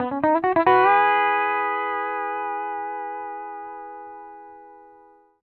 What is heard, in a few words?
PD LOOPS 140, Whole Lotta Country